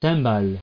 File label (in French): timbale